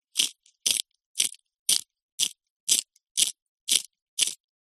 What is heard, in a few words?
office pencil sharpener metal small sharpening pencil